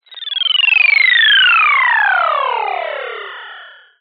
science fiction radio static interference descending beeping tone